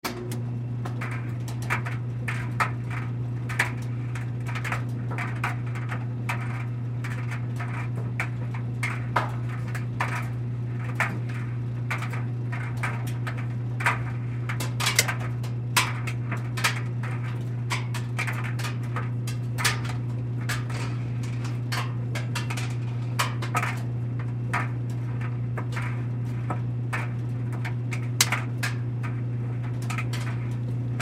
Dryer On Run Off, Dryer On Run Off Loose Objects Movement; Appliance; Household; Blastwave